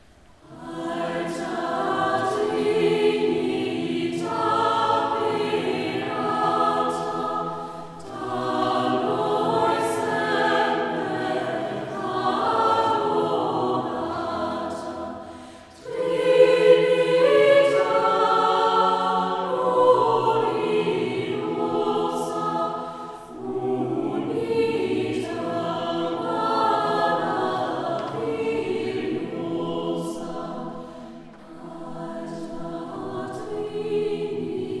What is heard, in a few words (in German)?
Jugendchor; Essen-Steeler Kinderchores; Alta trinita beata